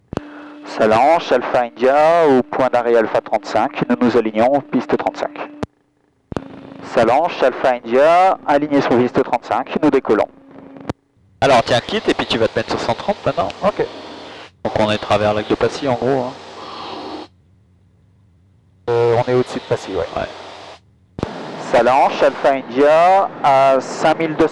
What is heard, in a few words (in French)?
Test attenuateur DVT1100